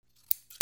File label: RIPPING